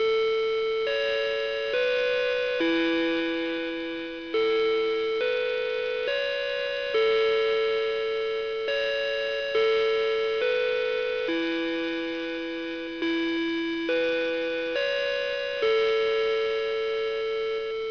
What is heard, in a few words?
Wall clock chime; alarm clock